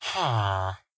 idle, villager; minecraft; mob

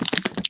step, spider; minecraft; mob

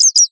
idle, bat; minecraft; mob

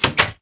door close

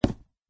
wood, dig; Eaglercraft 1.5

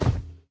walk, iron golem; minecraft; mob

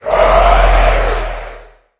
idle, wither; minecraft; mob

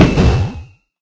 hit, enderdragon; minecraft

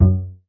bass, note

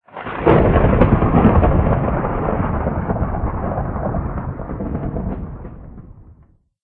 thunder, weather; ambient

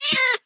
hit, cat; mob; minecraft

